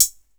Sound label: DR110 CHT, Boss DR-110